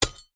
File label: impacts metal small impact with ground small stones